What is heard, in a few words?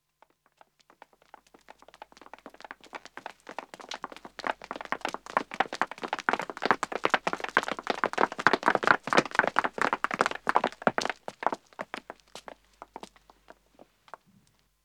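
Pavement Running 2 men & 2 women, Footsteps; BBC Sound Effects Library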